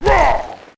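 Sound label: damage, monster; ARCANE; scholar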